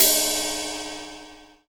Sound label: huge ride cymbal sound a sharp key; cymbal drum samples